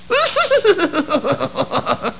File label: death; kenny